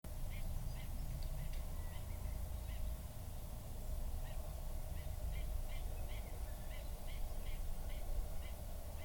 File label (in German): neuntoeter